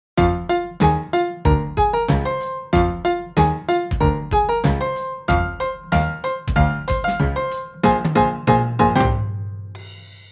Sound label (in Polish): wersja instrumentalna; EduMuzArte